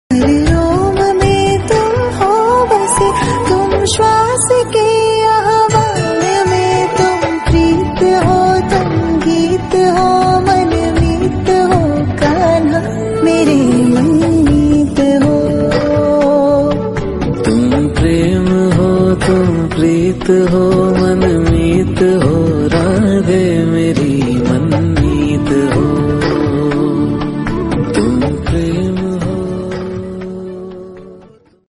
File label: Female Version Hindi Ringtone